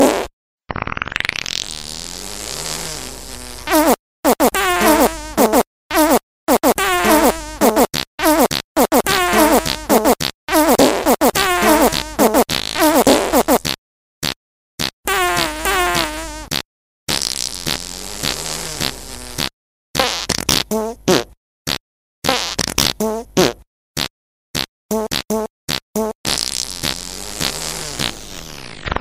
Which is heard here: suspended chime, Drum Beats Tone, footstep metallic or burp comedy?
burp comedy